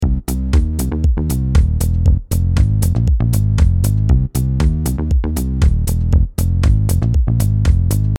pick bass

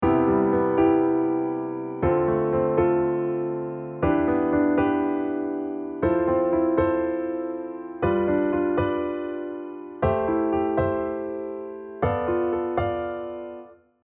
diatonic LH R5

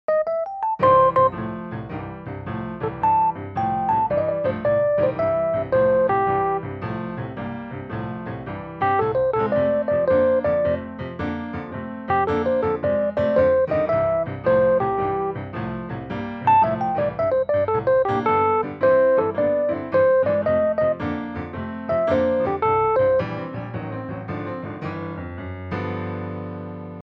blues major blue note

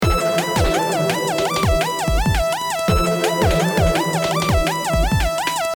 Main Lead

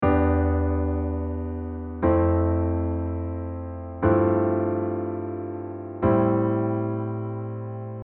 diatonic prog

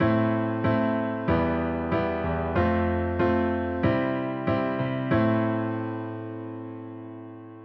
rhythm

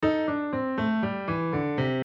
double maj pen

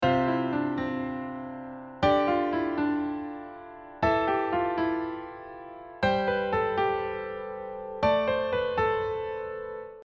sus2 sus4

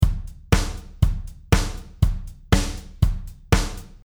8 beat

